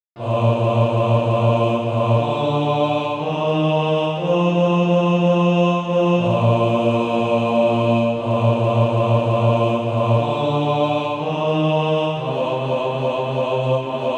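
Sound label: bas, Practise Music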